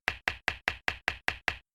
clap

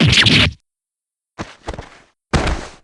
fall

